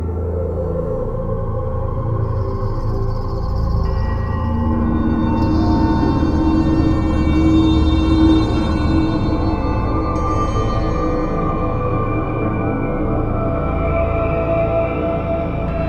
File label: music space; tau; freelancer-hd-edition